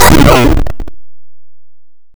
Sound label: kill; Blokos